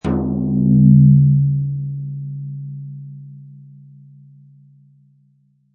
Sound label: Cartoon Drum Tympani Weird, Big bonk, hit on the head; Comedy/Cartoon - Drum, Tympani; Funny Sounds